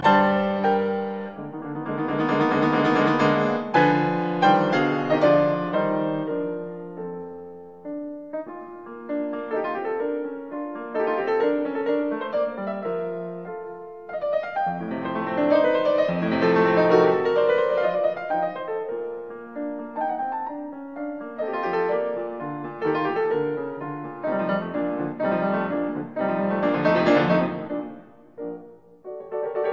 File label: Piano Sonata in B flat major, Menuetto; No 11; Op 22